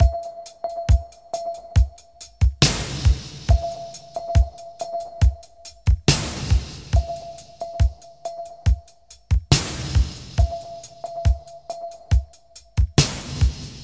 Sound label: 69 bpm 80s reggae drum loop sample c key